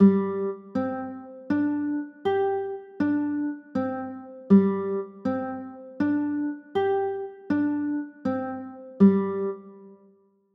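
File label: G sus4 chord, guitar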